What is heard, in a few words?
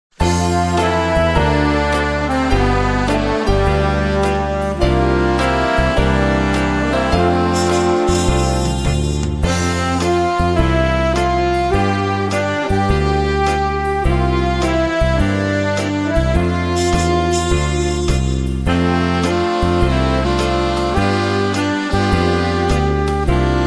Slow Fox Dancing